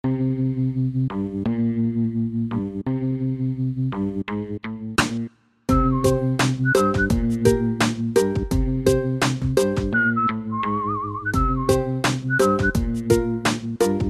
Whiskey Whistle

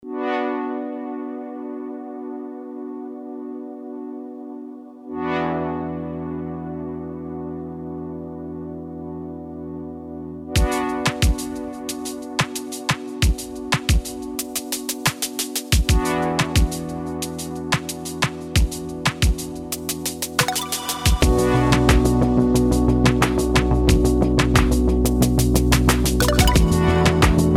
Warm Welcome

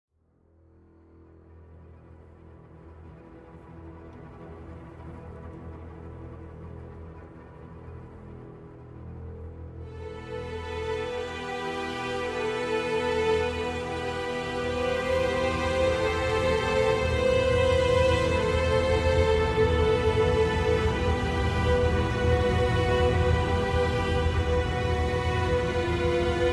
Adagio Funebre low register reduced

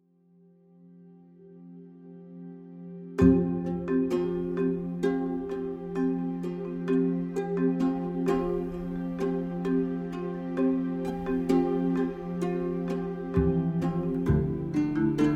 Quiet Walk

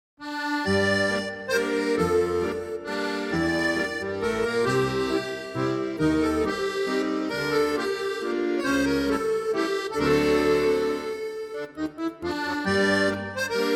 Frisian Accordion Waltz

